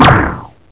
shell; PC Gamer IT CD 31; ARMRCMD

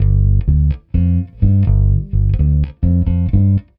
RnB, Rock Bass; ACID MUSIC

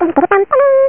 horn toot; CABOL II 2.0

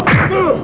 URGH; Hottest 5 (1995)(PDSoft); Red Wolf

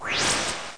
LAUNCH; 10,000 Graphics Pack Volume 1; Media Graphics International

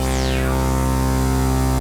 DIRTY C, SYNTH

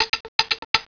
Drum Loop Tiny; Drums; The Complete Web Studio 2.0

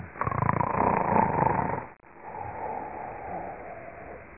snore

